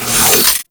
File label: Miter Alt Shot Stop; Tenno